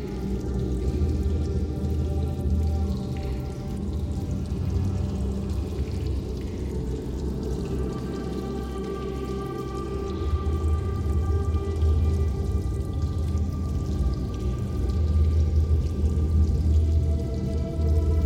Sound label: Caverns of Horror; Michael Ghelfi; Rivenwish Chasm